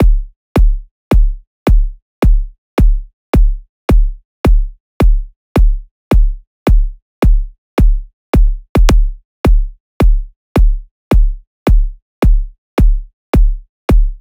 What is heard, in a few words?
loop kick 108; pallas